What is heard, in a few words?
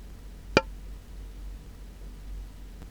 tap sound; ノイズクリアの防音性能